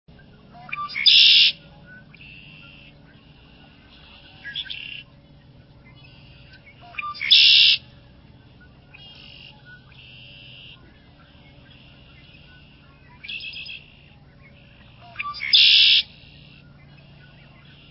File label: Red-winged Blackbird; Birds